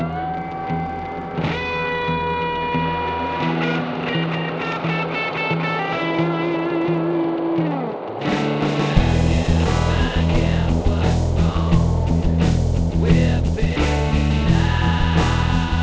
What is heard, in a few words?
children harmonic